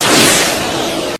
jump effect; theo akatsuki

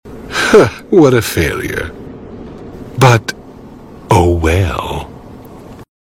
victory sound; theo akatsuki

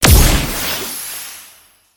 smoke; martyr

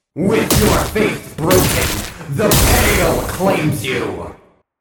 echo; gasharpoon

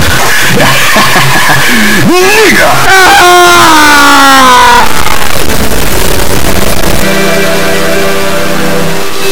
lose; freak fortress 2; mlg demo

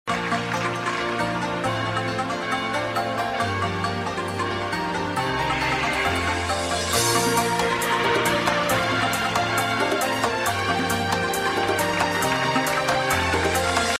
intro music; freak fortress 2; ricardo

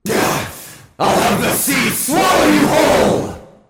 stab; gasharpoon

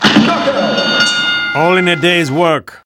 win; king dice

